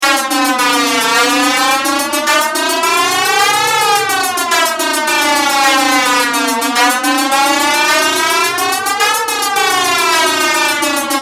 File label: Holmes jet flanger